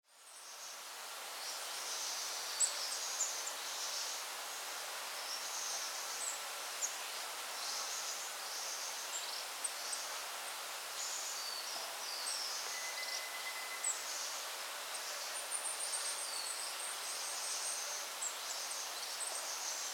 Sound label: birds in the forest; alishan